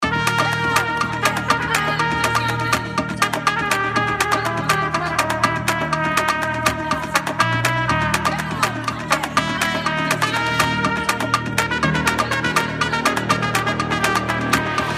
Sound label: D Phry 122 BPM; Torada; abt sounds sunrise sample pack